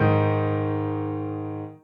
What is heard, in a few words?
soft hitwhistle